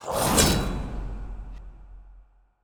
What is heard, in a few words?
hell Character Win; netent